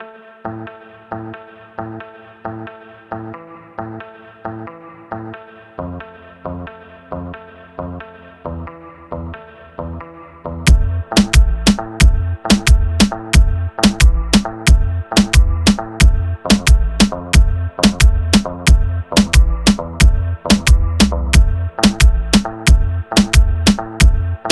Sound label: Instrumental Hip Hop Beats